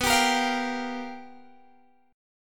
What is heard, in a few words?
G Minor 7th, B; Gm7/B; strummed